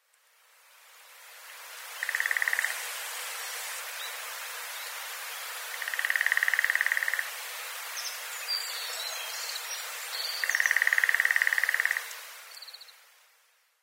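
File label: Lesser Spotted Woodpecker; koe; koakagera; obihiro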